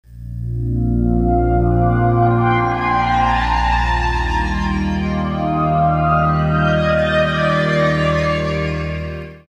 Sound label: sweep